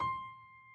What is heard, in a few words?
key; keySounds